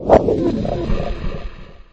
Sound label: crouch sound; omega tiger woods